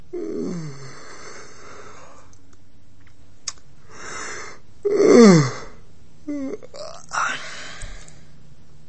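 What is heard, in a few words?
yawning with air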